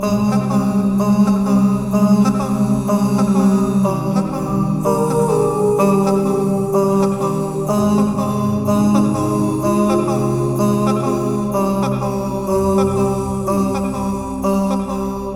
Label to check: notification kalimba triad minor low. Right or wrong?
Wrong